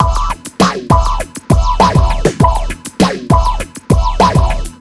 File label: Big pop, Rock & Pop